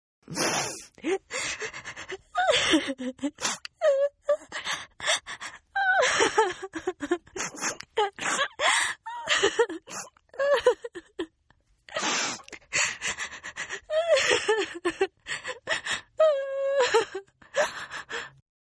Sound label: Crying Female; Voice; Partners In Rhyme